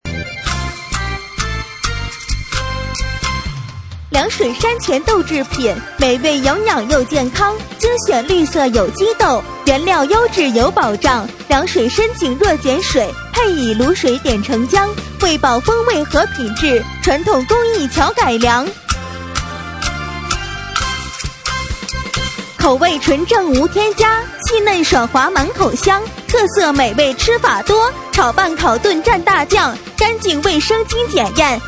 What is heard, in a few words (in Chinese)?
快板 豆腐